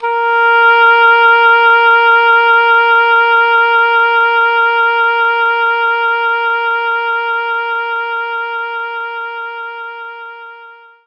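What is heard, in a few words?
Oboe A#3; knights try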